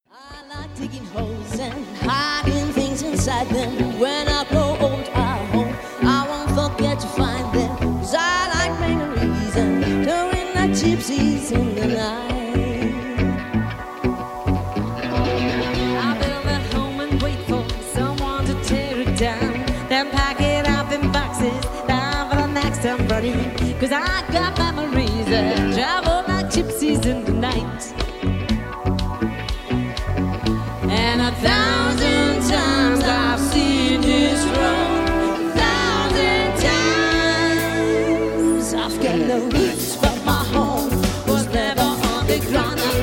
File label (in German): ton no roots laut; Live-Mitschnitte